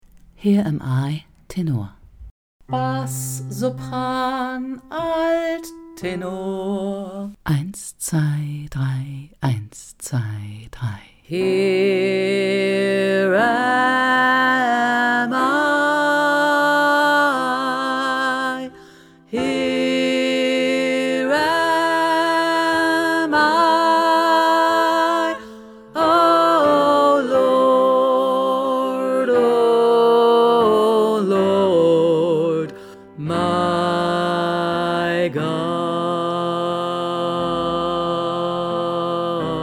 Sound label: tenor; here am i